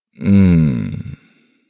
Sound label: man mmmm, asmr; voice ringtones